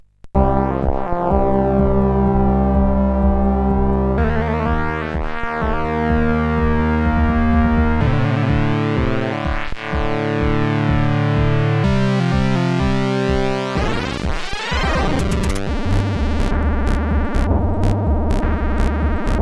Jazzy Ocean, CSG8580R5

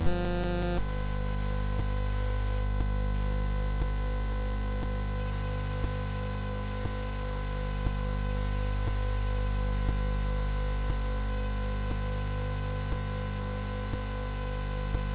sid CSG8580R5

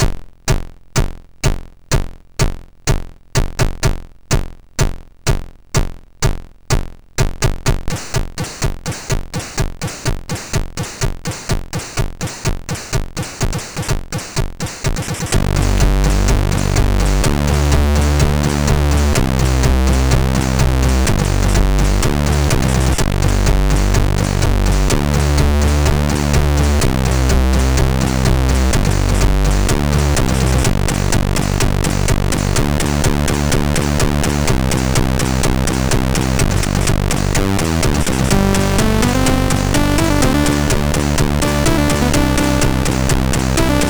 Death Metal; sid; hvsc

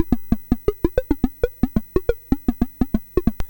Mini Golf, MOS6581R4

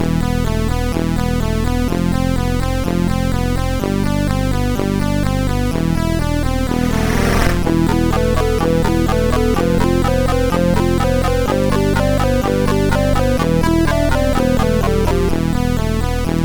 Bells, MOS6581R4

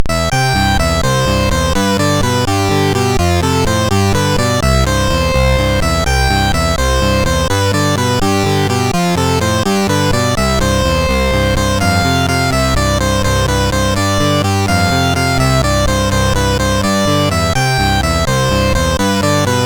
Monkey Music; sid; MOS6581R3

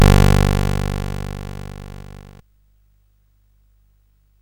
Frantic, CSG8580R5

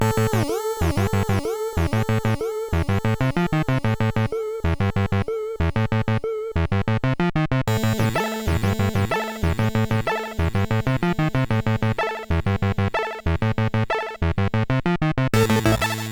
Mellow Bite sid CSG8580R5

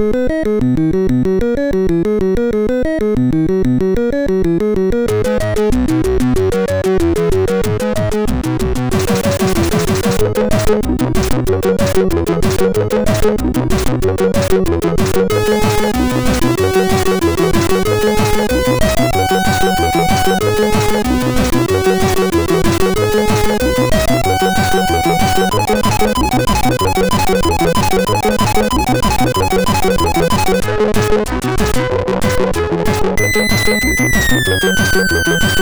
Ragtime, MOS6581R2

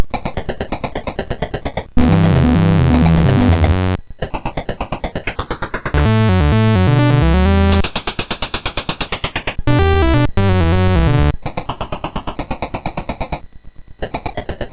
Boogie, MOS6581R3; hvsc

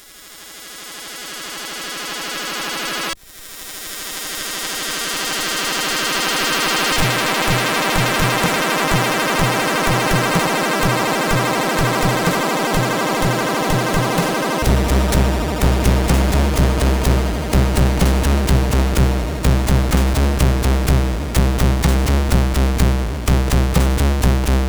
Acid Rains, CSG8580R5; sid

